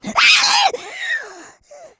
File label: rage, infected, female